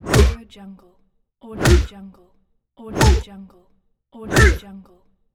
cartoon punch

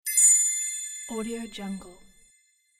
gold shine

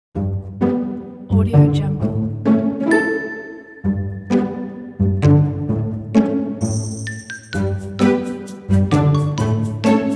quirky funny comedy logo

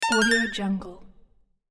thin vibrato arp up button

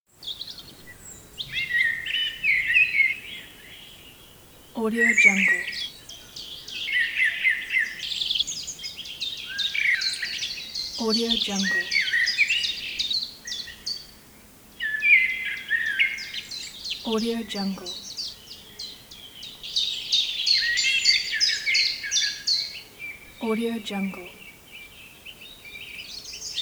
forest birds

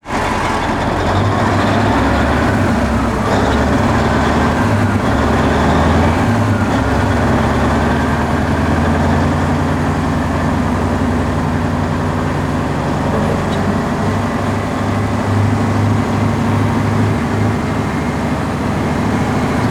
peterbilt semi dump truck driving constant; 1985; prosoundfx

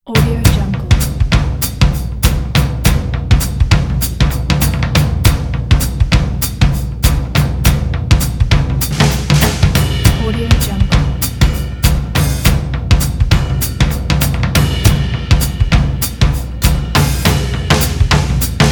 the jazz drums

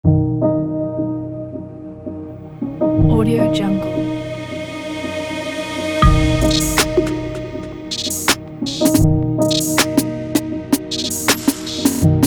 ambient beat; jackwinn

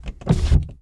servo window; soundmorph

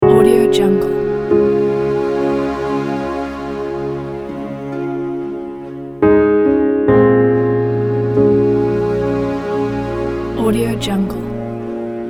worship church service

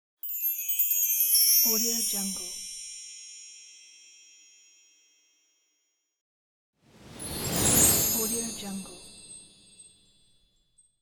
gold shine

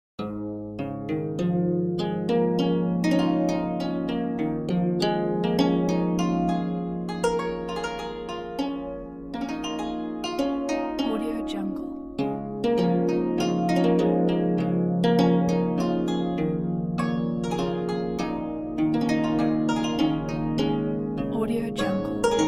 relaxing oriental

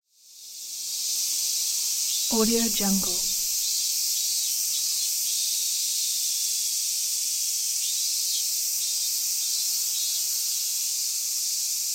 cicada japan summer sound

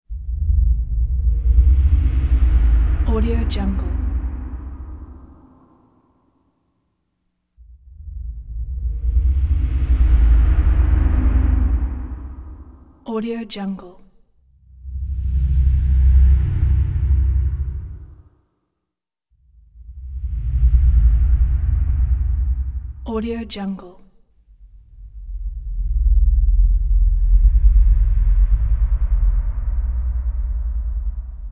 shadow deep rumble swell distant breathy hiss

